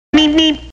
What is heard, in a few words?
Meep Meep; Sms Töne; Klingelton